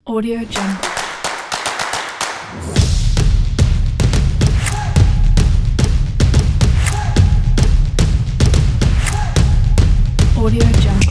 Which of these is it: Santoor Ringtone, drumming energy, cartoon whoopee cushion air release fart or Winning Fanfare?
drumming energy